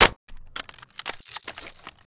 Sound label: rpd reload hit, foley